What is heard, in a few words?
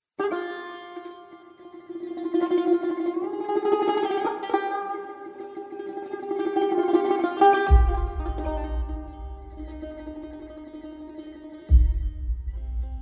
Sound Track